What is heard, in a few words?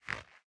armor; smite; ahpuch skin10